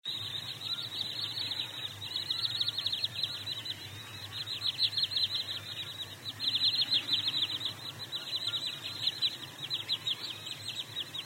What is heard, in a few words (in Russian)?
fifi golos